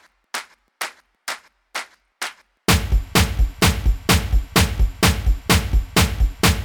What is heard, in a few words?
Drums